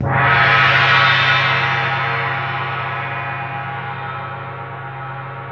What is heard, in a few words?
TAM TAM C4; E-MU Formula 4000 Series Vol. 5; Protozoa; Proteus2 Instrmt